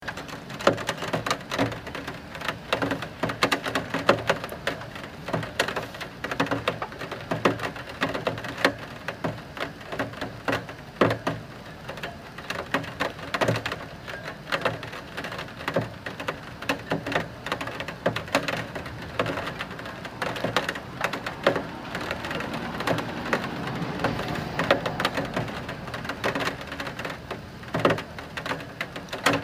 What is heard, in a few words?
rain hitting a window in new york city